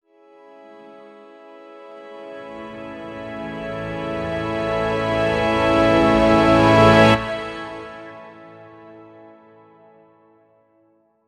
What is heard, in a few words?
orchestra transition